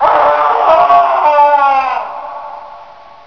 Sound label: agony, haunted; quake; fortress